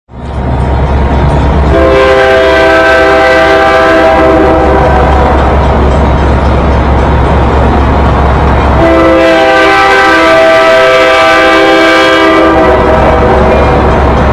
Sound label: diesel